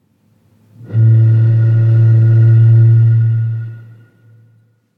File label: bass bottle